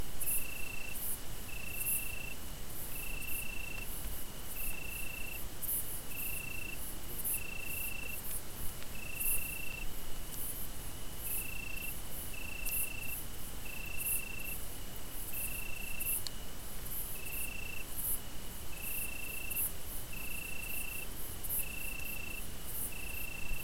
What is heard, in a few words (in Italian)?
notte cicale; Cicale e grilli; Soundscapes